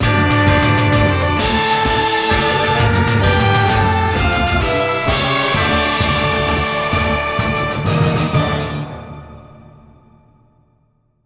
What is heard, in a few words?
Victory; TowerFall Dark World